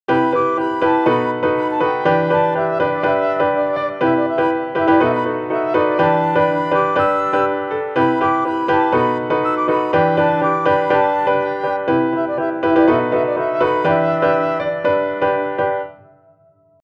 Session Player Block Chords melody, メロディーに連動したピアノ; Logic Pro 11; メロディーに合わせたリズム

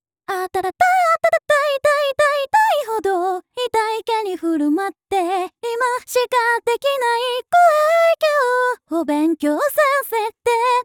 Synthesizer V, サビ, 調整前のバージョン, 歌声; Synthesizer V Studio 2 Pro

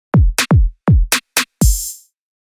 フィルのみのサウンド; 4小節目後半の2拍にフィル; Logic Pro 11; Session Player

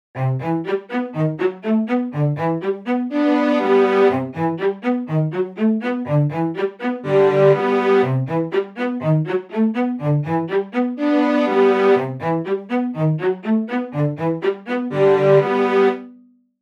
Strings Articulation, Spicatoを用いたストリングスフレーズ; Logic Pro 11; アーティキュレーション; 軽快な奏法

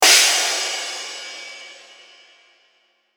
Crash, アクセントに使用したシンバルのサウンド; Logic Pro 11

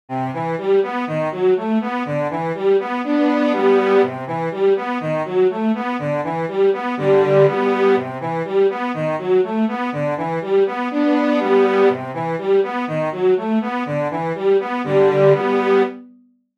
Strings Arp, 手動で調整したストリングスフレーズ; 一部を和音にしたり音の長さを調整しました; Logic Pro 11

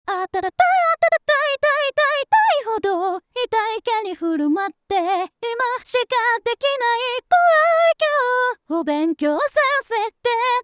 Synthesizer V, サビ, 適用前, 歌声; アクティブ; Synthesizer V Studio 2 Pro

